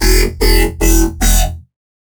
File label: E 150, Dubstep Bass Hits; Squirm; Ghosthack; Advent Calendar 2017